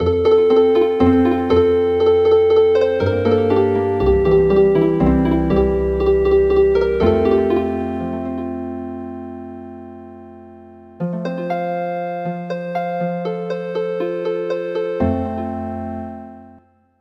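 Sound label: Healing Harp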